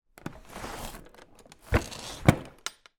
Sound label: household writing bureau wooden drawer open then close